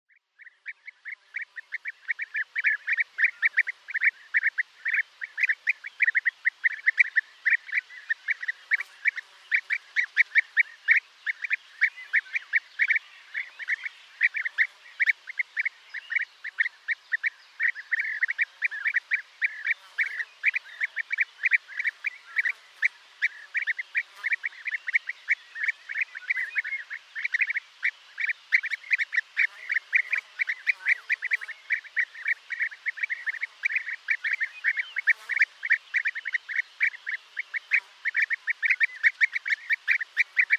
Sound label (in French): Guepier d'Europe, chants oiseaux